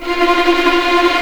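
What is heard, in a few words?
F4 tremolo violins, left; East West; The Ultimate String Collection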